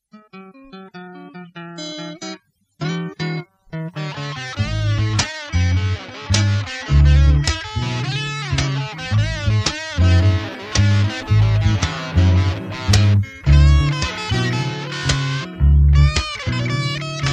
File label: BLUES